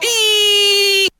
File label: eee cartoon, voice; ooh ahh